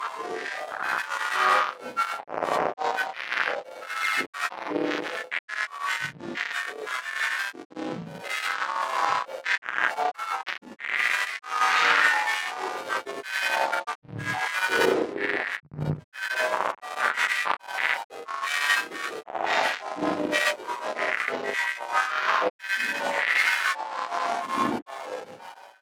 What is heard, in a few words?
Granular Texture UI Glitch; Glitchedtones; Granular Textures